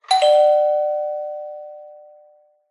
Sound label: household doorbell ring fast; zapsplat